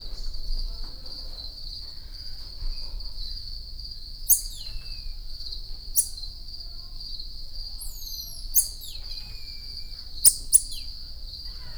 hatterben hazi tucskok, feherajku tamarin vagy voroshasu tamarin; debreceni zoo 2012; marantz puska

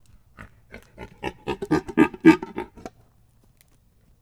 gímszarvas erősödő horkantás; budakeszi zoo 2010

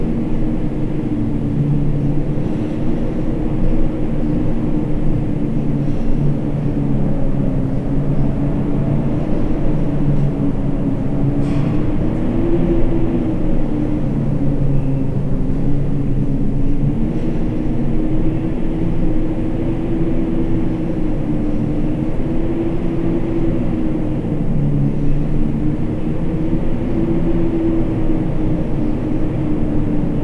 ajto mogul, szel; angyalfold

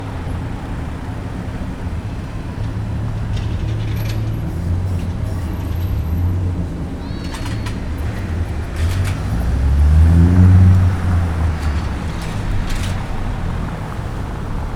troli felsővezeték

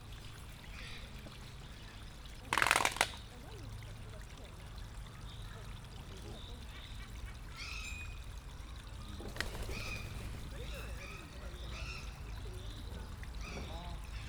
csőrével csattog, majd elszalad, marabu; debreceni zoo 2019

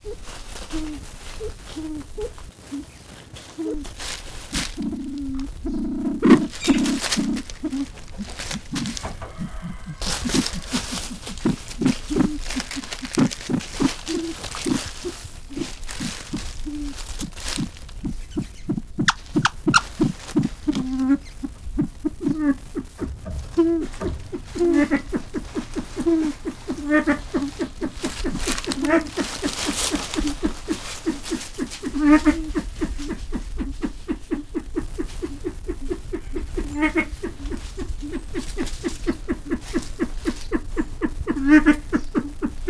beszedes kedveben, ezust facan; miskolci zoo 2009

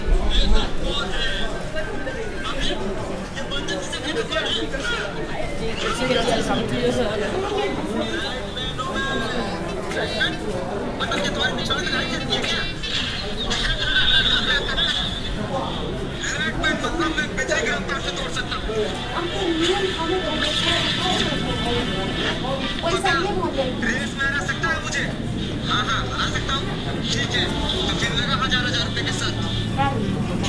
majmok temploma bazár

